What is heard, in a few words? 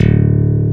STICK; E-MU Formula 4000 Series Vol. 3 – Analog Odyssey; VK+ Instruments